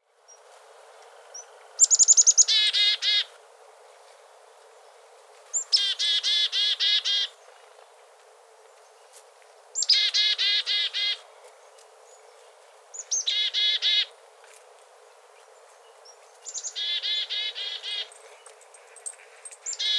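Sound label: Black-capped Chickadee deedees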